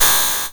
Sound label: blips, opl3